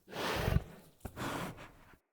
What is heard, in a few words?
Wolf Idle Five Sniff, Animals Wolf Vocals